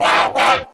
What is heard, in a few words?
Raptor Attack Vocals